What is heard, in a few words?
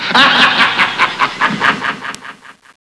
EVIL LAF